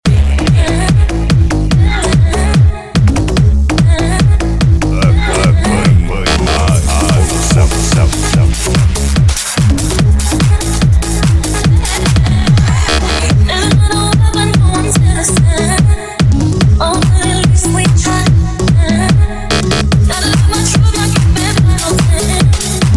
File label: sound car